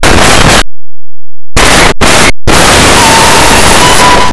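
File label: padlock; Blue Heat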